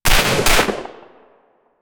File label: suppressed 9mm gunshot